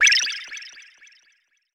heal

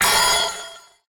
robot step

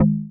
button

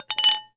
tableware

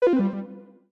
button

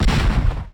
gun fire

